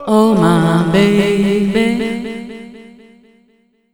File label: OH MY BABY, Funky Vocals, SHOUTS; USB Soundscan vol.18